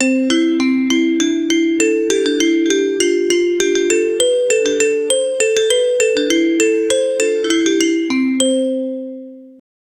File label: a quick ascending three n, xylophone